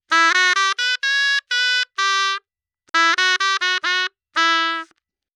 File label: 3-4 Emin 128 Dry, Melody, Shenai; Sample Banks